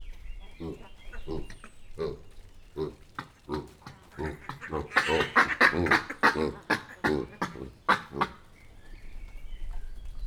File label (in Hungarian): galléros pávián; pecsi zoo 2017